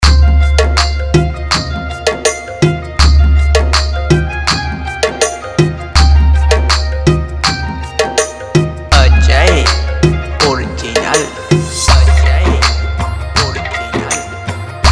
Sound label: Hindi Songs